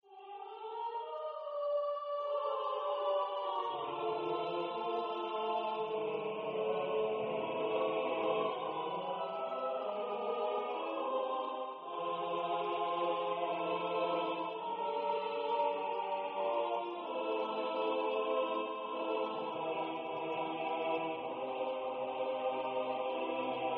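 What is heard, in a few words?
miniatures for mixed choir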